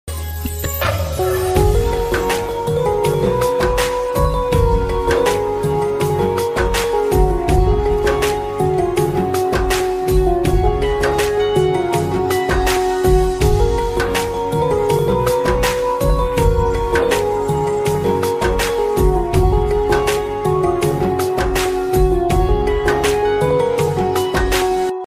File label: piano flute ringtone